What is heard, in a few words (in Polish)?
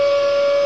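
winda, elevator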